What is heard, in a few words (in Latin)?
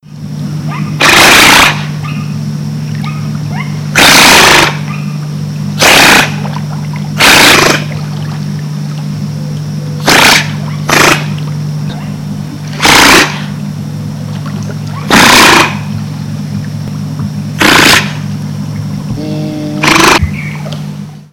Pteronura brasiliensis; R Negro; Silveira